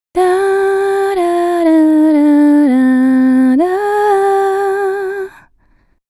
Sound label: Fem. Voc. less Gain; API 3124